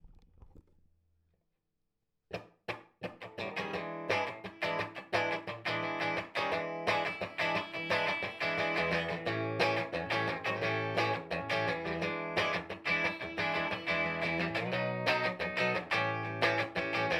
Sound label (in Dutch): vrolijk uptempo met einde